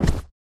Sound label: thinking cap; build mode